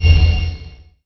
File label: Teleprt